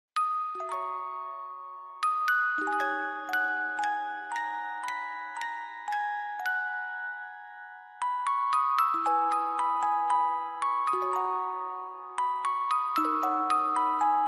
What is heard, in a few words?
music box ver.